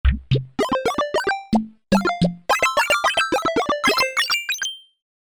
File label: Video Game, Korg MSC-1S Variety Combinations